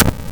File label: Impiety Impact; Warframe; Dagath